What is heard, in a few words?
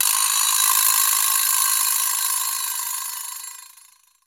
Yamaha SU700 Vibraslap